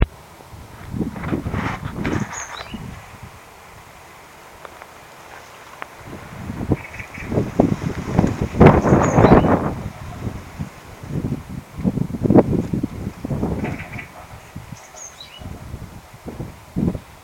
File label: great tit